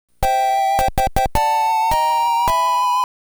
Stage Clear Theme (Course Clear); Super Mario Land; DMG; GB

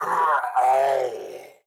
Prisoner Death

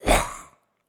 Attack

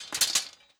Mermaid Death Metal

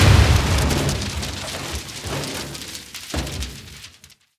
Building Particle Falls On Floor

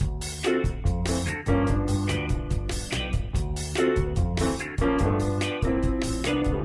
Flustered Thrilled; The Act; Arcade, iOS, MacOS, Mobile

